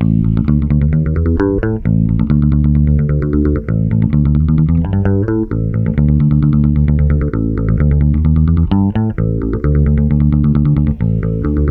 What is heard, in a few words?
FEVER 131, Bass Grooves; USB Soundscan vol.30; AKAI